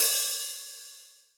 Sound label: open hihat, Zoom MRT-3B